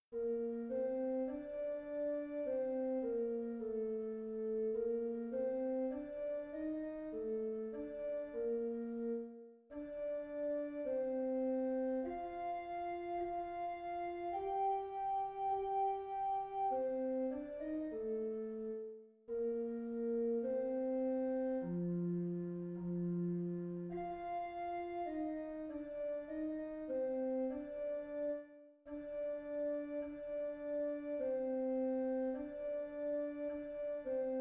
tenor alone, choir; bach 6 beweis dein macht; beweis dein macht herr jesu christ bwv 6

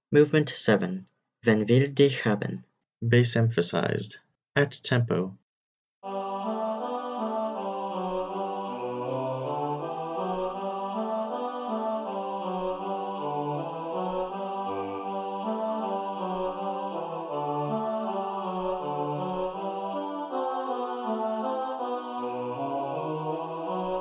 bass emphasized at tempo, choir

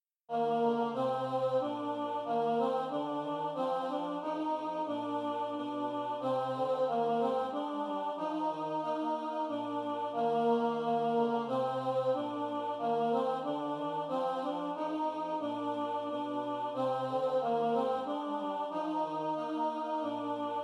alto over bass, choir; hymnal 1982; 91 break forth o beauteous heavenly light

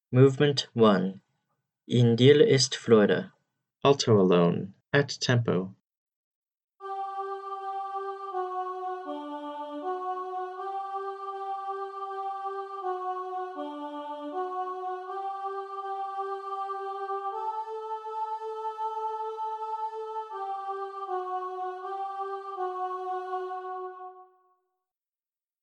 alto alone at tempo, choir; edwards m a in dir ist freude movement 1 in dir ist freude

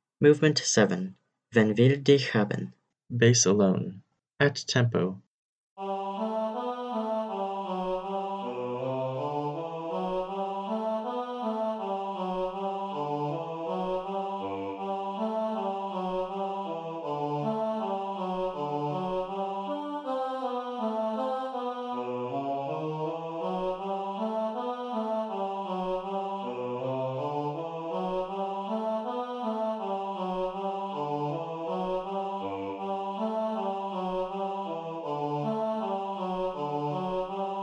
bass alone at tempo

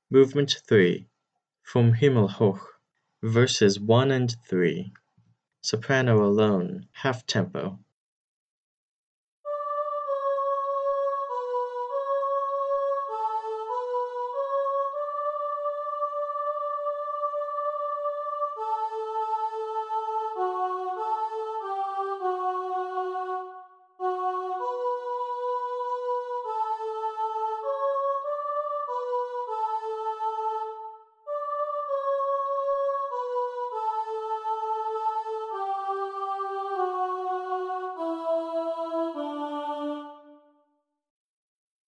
soprano alone half tempo, choir

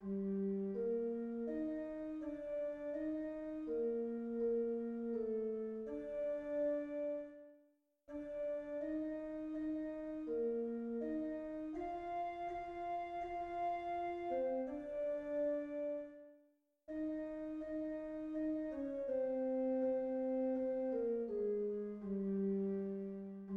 tenor alone, choir; bach 140 wachet auf; bwv 140

